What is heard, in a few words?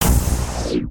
Boss Special Attack Shoot